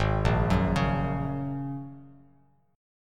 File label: G sharp 7th Suspended 2nd Suspended 4th down Bass Standard, chord; G#7sus2sus4 arpeggiated; JGuitar